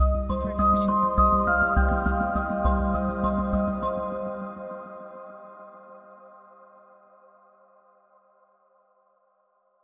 Serious Synths Ident; SoundsCrate

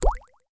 water

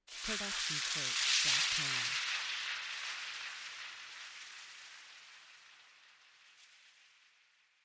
Odd Magic Effect; SoundsCrate

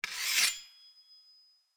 Draw Sword; soundscrate

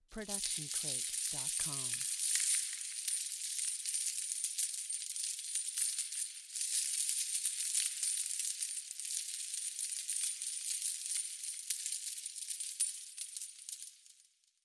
magical coins falling; soundscrate

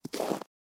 Snow Walking Single Step; SoundsCrate

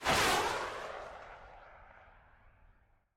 missile fly by; soundscrate

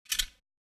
sword click; soundscrate

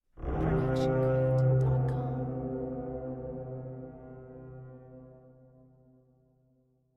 Horror Low Screech; SoundsCrate

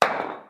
Metal Weight Hitting Floor

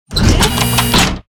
Robotic Movement; SoundsCrate

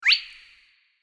slip

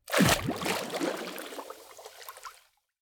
heavy splash; soundscrate

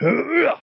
man attack heavy; soundscrate

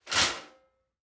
Metal Footfall; soundscrate

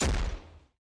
High Distorted Gun Shot; SoundsCrate

